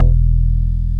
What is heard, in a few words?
PULL BAS; Synclavier Sampler Library; KEYS AND GUITARS